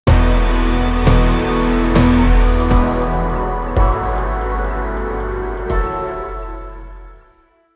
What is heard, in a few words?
quest complete impactful